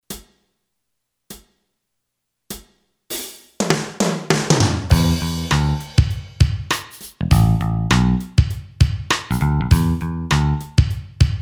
ROCK, Boss DR-3

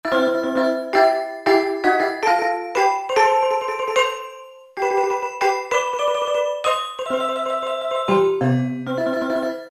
Jamaican Steel, Roland PN-D50-02

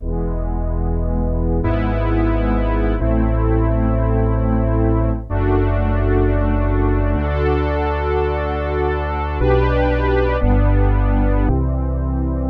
Strng Brass, Roland JX-8P

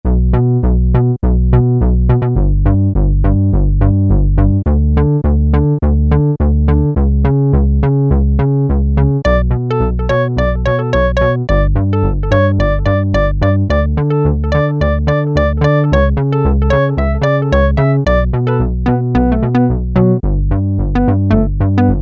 SynBass, Peavey DPM Spectrum Bass

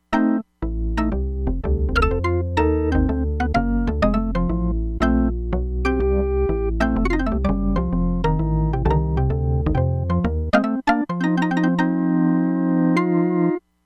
HAMMOND B 3, Crumar Bit 99; Factory Programs

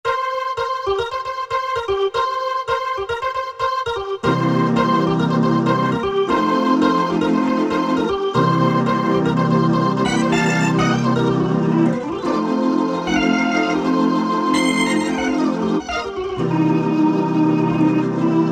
Organ C3; Generalmusic Equinox

